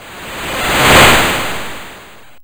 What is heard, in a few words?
YM Noise; NOISES; ChipShop Deluxe; ChipShop Hits